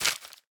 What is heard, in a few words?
leaf pick up; 摇钱树; ACTIONS